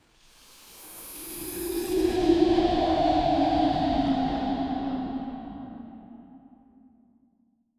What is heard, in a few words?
Ghost Whoosh